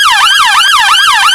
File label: yelp, sirens; whelen std (uk)